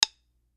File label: drum stick